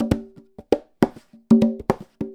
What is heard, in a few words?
Conga Loop (100BPM); musicradar